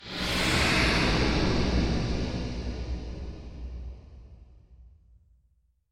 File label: Woosh